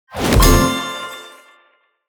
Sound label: pick n click; netent